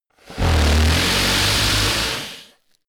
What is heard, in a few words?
monster snore heavy low; comedy-cartoon; koerpergeraeusche